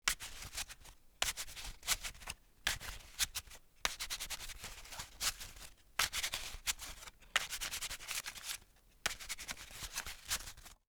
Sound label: shuffling cards